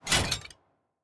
ui battle preparation

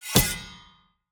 sword grab; player

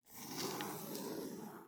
ambience room shadow room working layer

